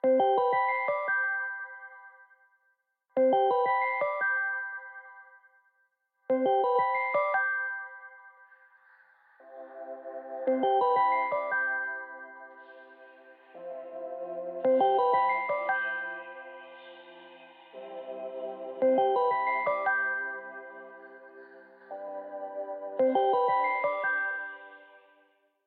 Samsung Galaxy S9, dzwonki na telefon

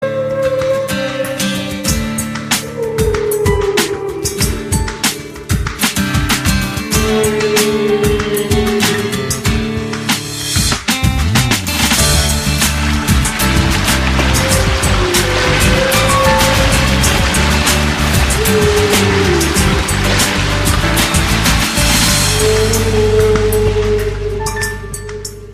samsung note 6

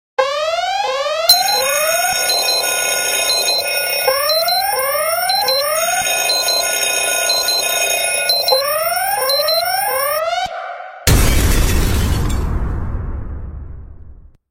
Annoying Loud Alarm

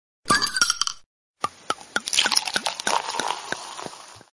Beer Sound

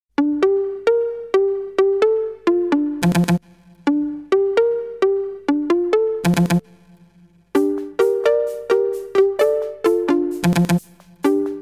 samsung galaxy s6 orginal

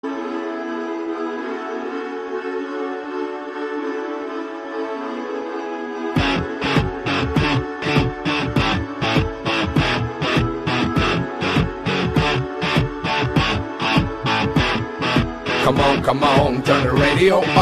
Rock Metal

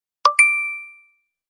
iPhone 14 Pro Max tone; dzwonki; telefon komórkowy

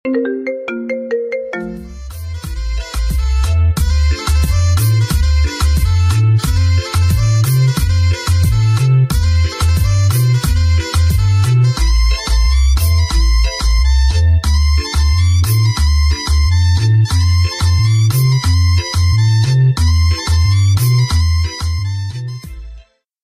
Marimba Remix; Dzwonki na telefon

